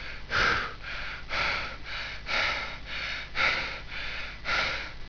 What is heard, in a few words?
breathe fast